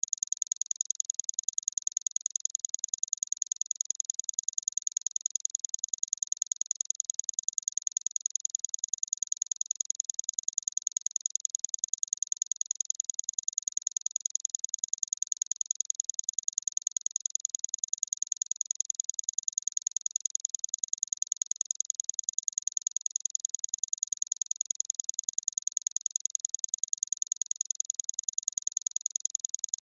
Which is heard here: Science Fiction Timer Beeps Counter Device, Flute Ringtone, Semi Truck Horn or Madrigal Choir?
Science Fiction Timer Beeps Counter Device